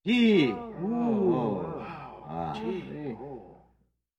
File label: Crowd disbelief reaction